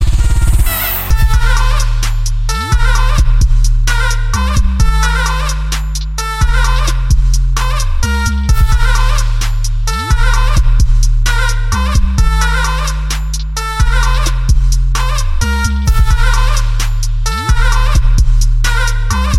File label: zurna trap